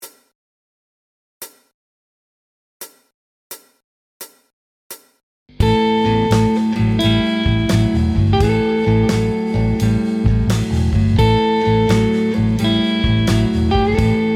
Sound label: slide blues